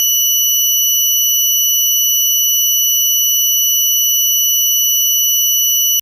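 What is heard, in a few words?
FM Sawtooth DX100 F#6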